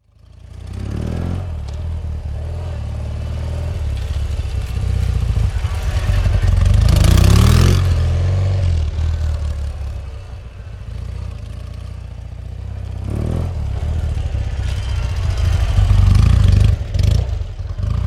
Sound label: Motorbike BMW Cruising around, BMW 1100 cruising around; BMW 1100 cruising around car park - various up and aways - up stop and off; Motorcycle; Tom Hutchings